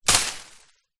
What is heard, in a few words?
bushes leaves hit with wood post; foley